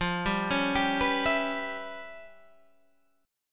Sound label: E Minor 6th F, Em6/F chord; arpeggiated